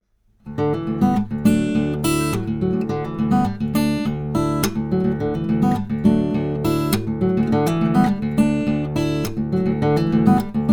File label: studio projects B1